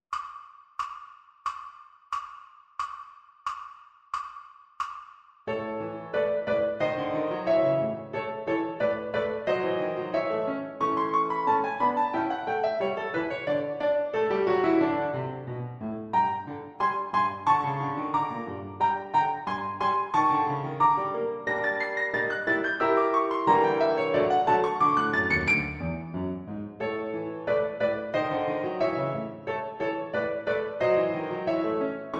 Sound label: Backing Track Piano; ViolinSchool